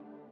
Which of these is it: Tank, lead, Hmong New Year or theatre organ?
lead